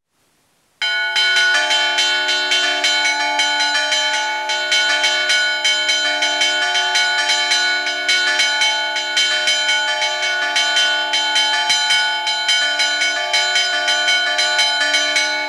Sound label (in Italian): campane, Musica in 6/8 per due campane; chiesa di Etto; Etto (Valle Trompia, Brescia)